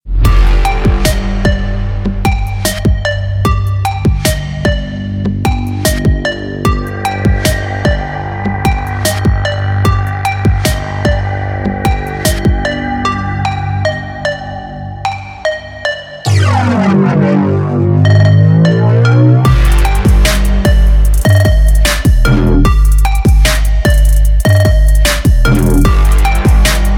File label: trap monsters kit